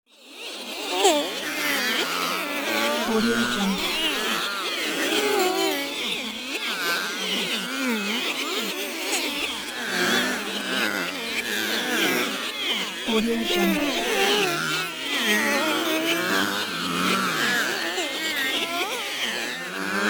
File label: cartoon group of kazoo flies by